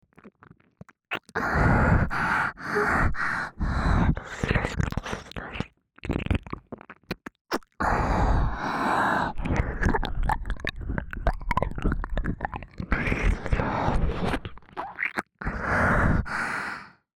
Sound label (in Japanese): 喘ぎ 耳舐め; エロかわJKと子作りえっち♪; SEなし